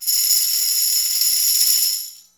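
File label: tambourine roll; InVision Interactive; Lightware VOL-1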